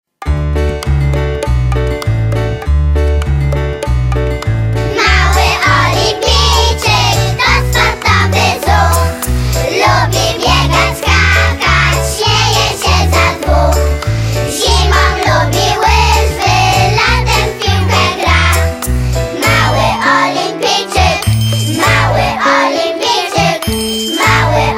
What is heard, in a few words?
hymn